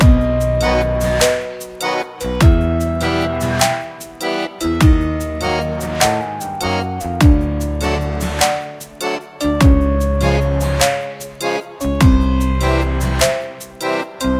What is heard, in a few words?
Stinger; club penguin island; android